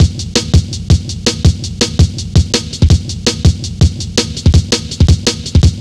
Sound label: coincident, Drum Bass Drumloops 165bpm; Zero-G; Total Drum Bass